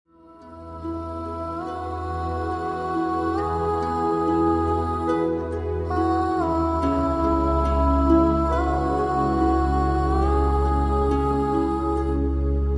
Angelic Lullaby

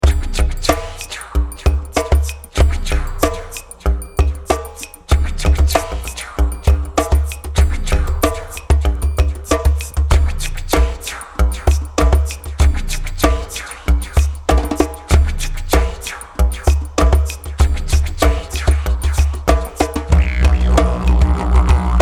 Energetic Tribal Music

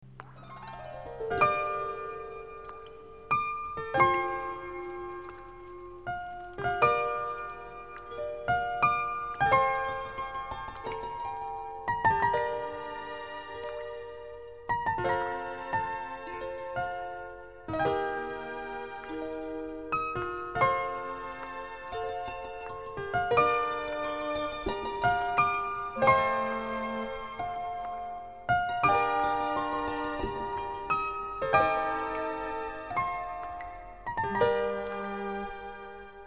Magical Harp

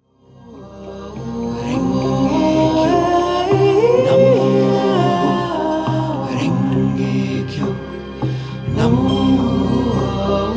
Chakra Healing Chants

